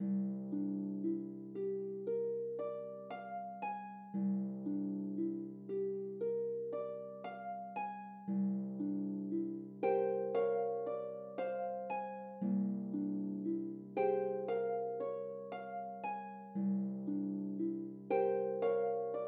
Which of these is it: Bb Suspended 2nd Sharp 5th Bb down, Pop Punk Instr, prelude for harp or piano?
prelude for harp